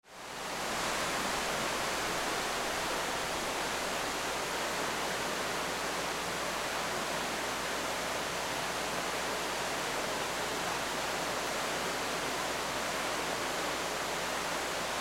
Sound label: waterfall